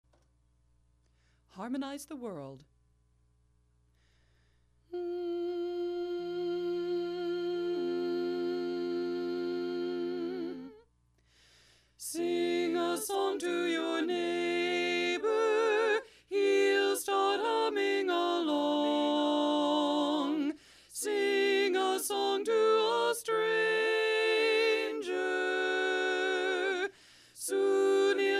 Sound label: Harmonize lead dom